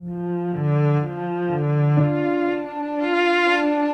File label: strings, Loops, Sad